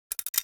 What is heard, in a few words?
shotgun trap reset; Rust